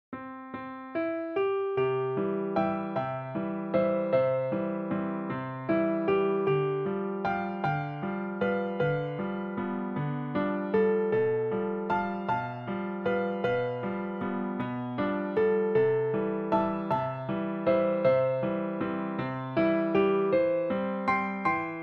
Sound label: piano; the blue danube